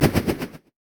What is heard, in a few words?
axe throw; olaf